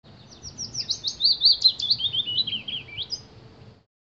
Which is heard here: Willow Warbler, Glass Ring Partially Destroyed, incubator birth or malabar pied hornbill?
Willow Warbler